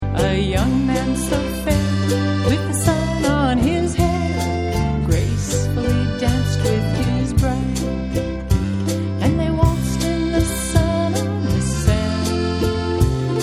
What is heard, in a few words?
waltz; gualala